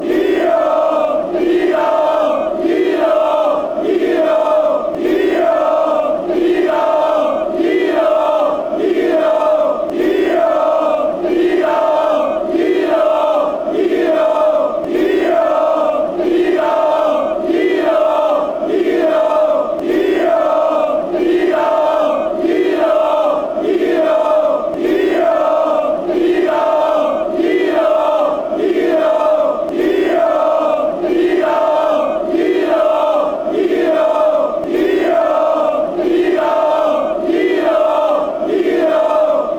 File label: bohemians chant